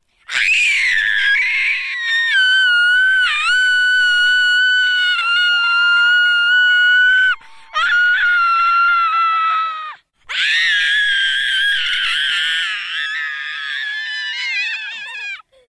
kreischende kinder

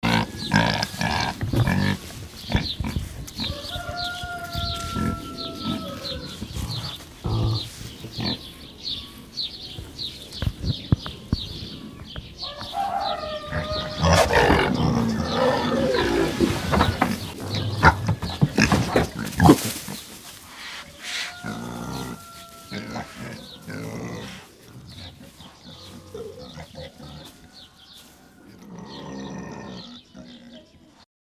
Schweinegrunzen

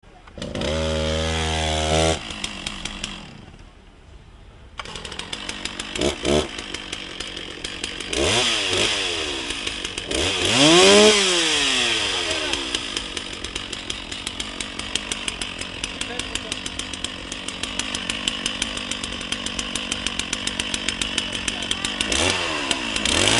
motorsaege

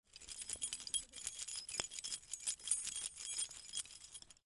schluesselbundrascheln

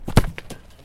ball kicken

